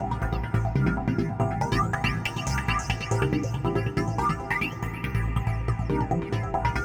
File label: TECHNO SYNTH 140; Keys